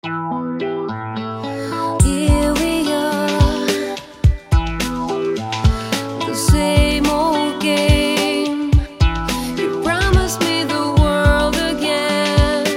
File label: Lullabye